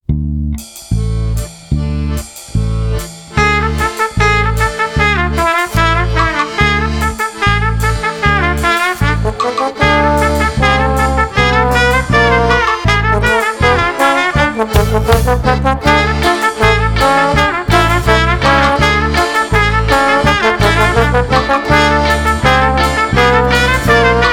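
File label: ukrainian folk punk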